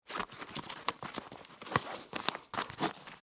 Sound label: Boot Untie Laces; Foley; Dressing; BlastWave